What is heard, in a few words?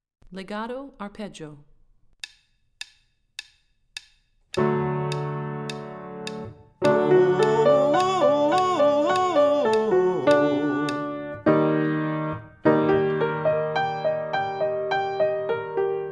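Legato Arpeggio - High, Vocal Warm-ups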